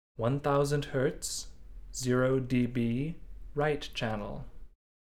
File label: Test Signal